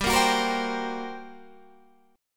G Minor Major 7th Double Flat 5th, G, Guitar, Standard; strummed; JGuitar